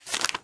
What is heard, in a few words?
paper flip; draw pixels